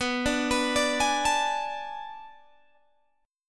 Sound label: B Minor 13th B down Guitar Open G